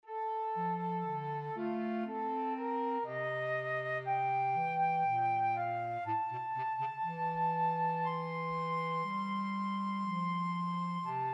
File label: inventing free counterpoint; The first task for this project was to create a second melody for two given short melodies